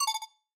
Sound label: cursor back